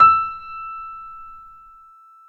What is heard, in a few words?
PNO MF; Synclavier Sampler Library